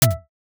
precision click; oneshot

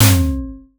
impact hit

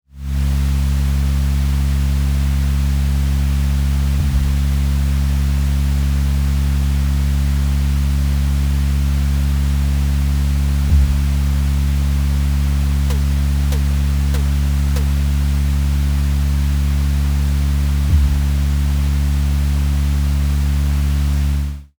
car inside road

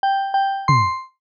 recipe timer ready

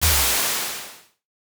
air release; horror sf

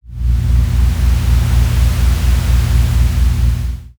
horror dark drone

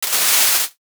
velcro peel short; foley

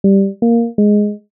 suspicion pizzicato

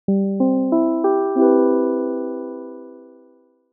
logo warm studio mark

